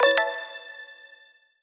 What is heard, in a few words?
restart; osu